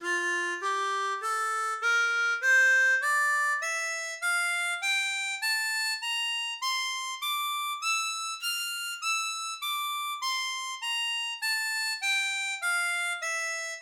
Fa Majeur, Gammes, harmonica

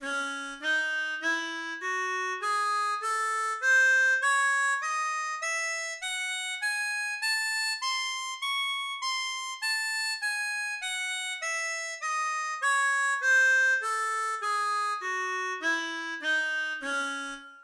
Do D Mineur, Gammes, harmonica

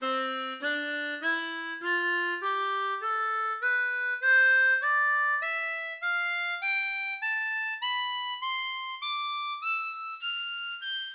Gamme Diatonique, harmonica

